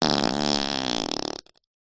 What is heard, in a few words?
human fart dry rip